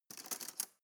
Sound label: cutlery teaspoon drawer grab